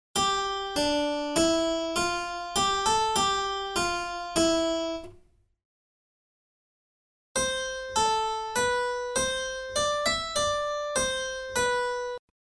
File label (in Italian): Tema e risposta